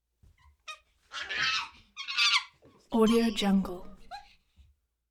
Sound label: chimpanzee chatter interior some screams